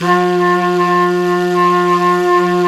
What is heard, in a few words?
flute vox, singin' flute